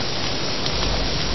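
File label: fire single noisy short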